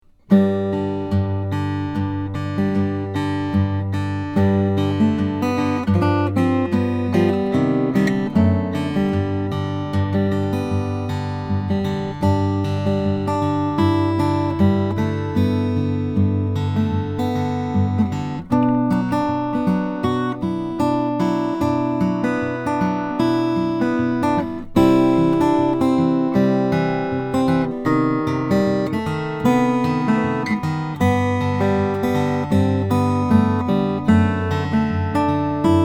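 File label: lars rasmussen c cutaway tree mahogany, guitars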